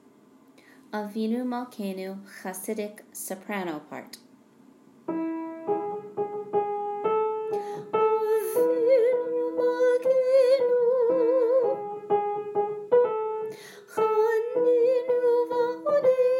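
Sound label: Avinu Malkenu Hassidic Soprano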